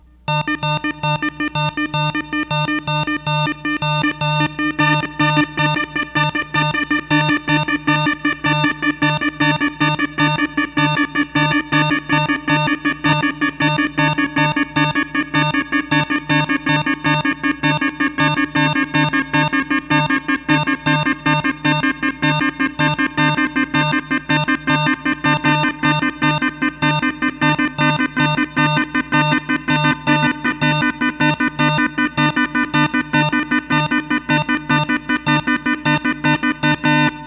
Synths

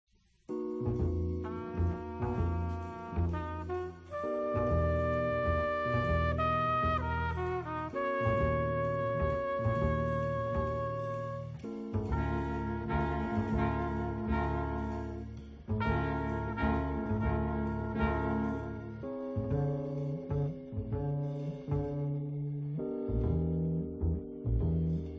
Cool Jazz; Silva Thins

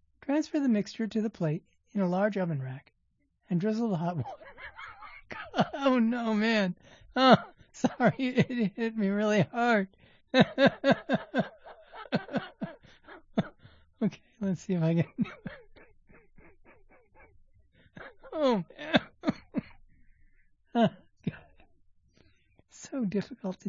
Laugh Attack

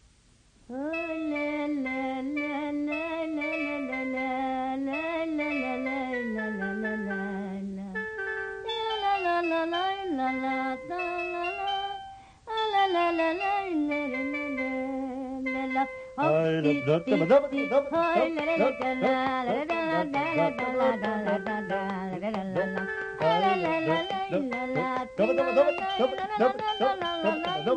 gypsy+600 melody; Prophet

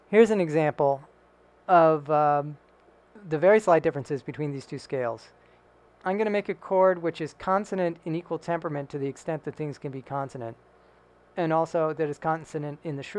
sruti, Sruti Box; iPhone

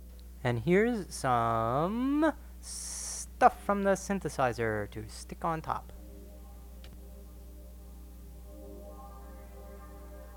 Synthesizer parts

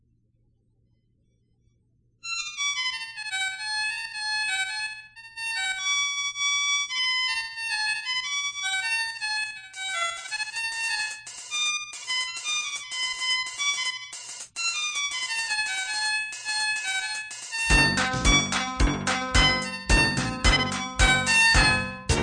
P600 Melody, Env. Foll

